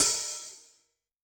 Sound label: Open, HiHat; Yamaha DTXpress-II